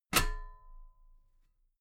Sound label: metal ladder step heavy